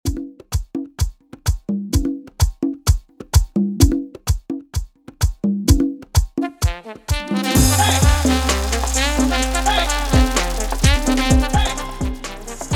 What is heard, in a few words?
Intro Break Outro 128BPM